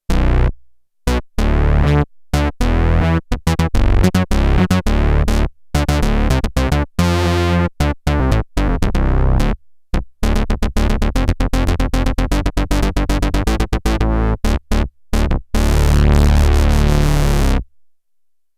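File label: Moog Bass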